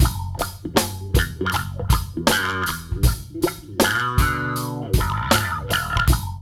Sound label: LOOP